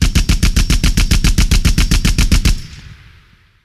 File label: Gun, Machine, Gattling, Long Burst; gun and weapon sounds; captain audio; fully loaded